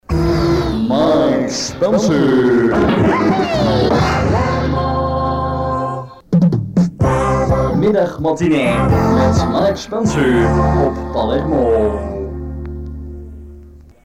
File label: jingles; Palermo Diest